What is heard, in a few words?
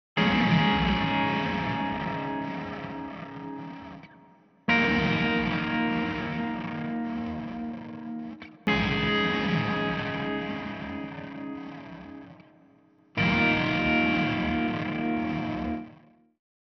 guit